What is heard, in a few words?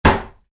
Wood hit wood heavy, Wood, Impact: Wood, Medium; Materials; Stuart Duffield